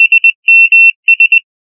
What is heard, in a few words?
2700 sms